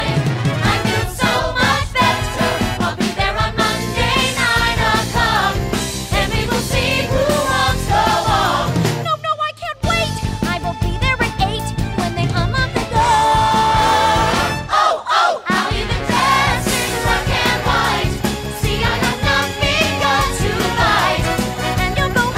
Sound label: Practice Track vocals; theatre; auditions